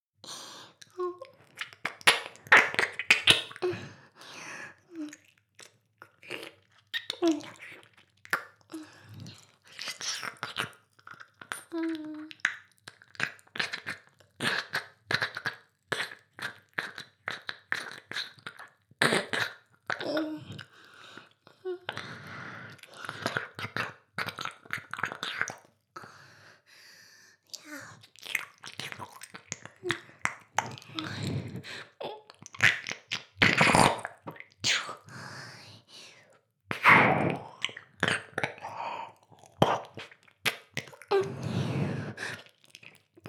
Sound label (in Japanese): 耳舐め, ASMR; 全妹計画２; 【メスガキ妹】わからせてくれるのよねぇ～?おにぃ～・ちゃん♪【全妹計画<オール・シスターズ> 2妹め】